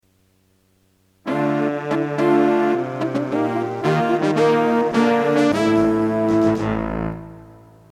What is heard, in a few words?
sample mt32